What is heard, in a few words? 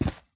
Steps Dirt